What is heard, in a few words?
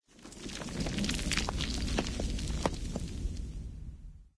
rock, materials; ambient; Source Engine; video game